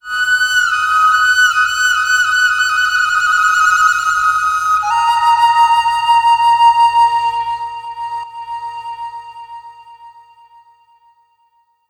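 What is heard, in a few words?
Chinese FLUTE; Soundscan vol.60; Asian Traditions; AKAI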